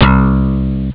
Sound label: bass cool; Amiga Soundtracker sample